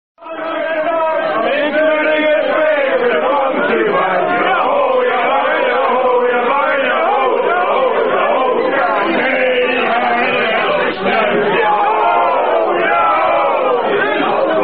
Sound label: Rugby Sing-A-Long; The Ratja